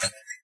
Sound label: bump; There; ThereClient